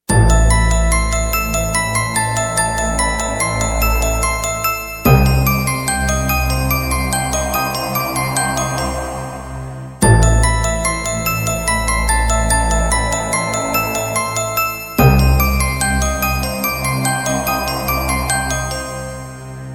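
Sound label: paranormal horror ringtone; android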